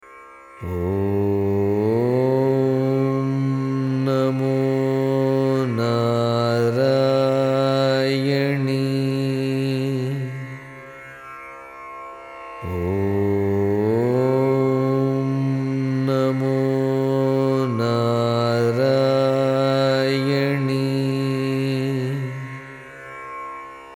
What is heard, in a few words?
sri narayani mantra meditation; arul thiru sakthi amma